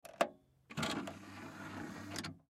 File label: Dvd Player Open Disc Tray Push Button Slide Out; CD Players; Technology; Blastwave